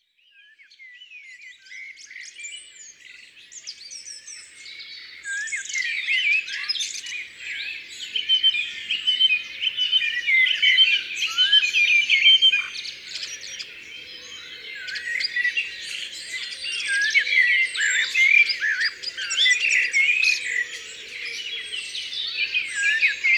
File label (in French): Merle Noir, Ardeche; Concerts Naturels De l'Ardeche